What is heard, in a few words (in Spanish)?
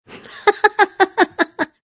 reirse de la mujer